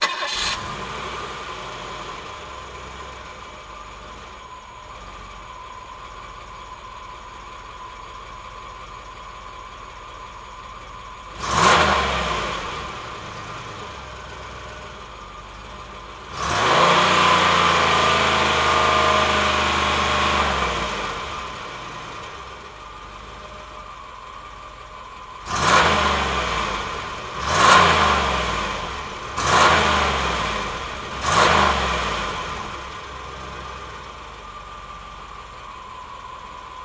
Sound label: Cadillac 1990 Exterior Stationary Revs, Short, Long